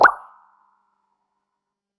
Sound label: Cartoon Pop